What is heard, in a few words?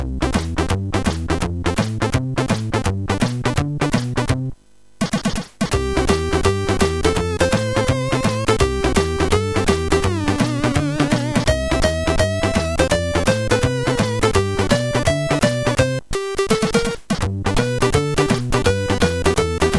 Guitar, MOS6581R4